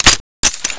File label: ak74 clip in, weapons